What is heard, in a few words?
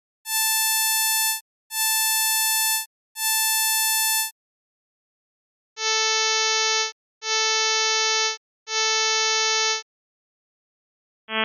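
Waveforms with Different Phases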